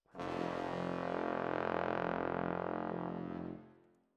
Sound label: Tromb ASS